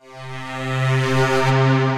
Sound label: Moon Pad Split, Synth Pad; The Forge by Hecq; Ableton; Factory Packs